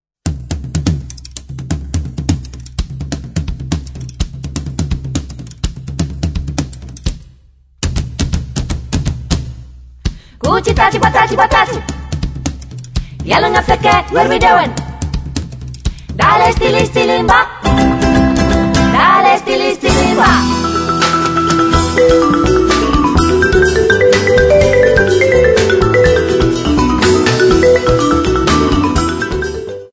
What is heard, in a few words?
Stilimba